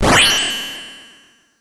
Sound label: Shining 8 Bit